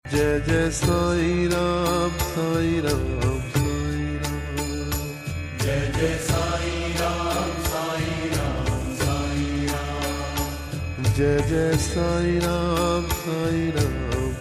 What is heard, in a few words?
Sai Baba Dhun